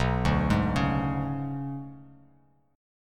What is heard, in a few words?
B Minor 6th Add 9th B; Bm6add9 arpeggiated; 7,5,6,6; JGuitar